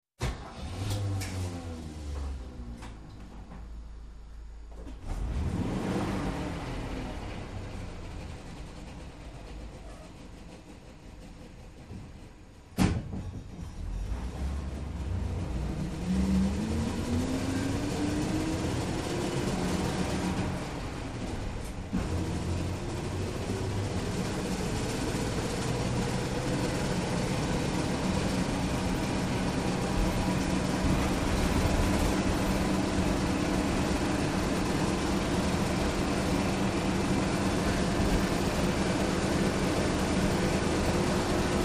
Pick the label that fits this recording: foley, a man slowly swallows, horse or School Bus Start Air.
School Bus Start Air